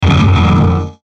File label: static flange; Static Flange Low Buzz Burst; Production Element Imaging Element Accent Transition; Blastwave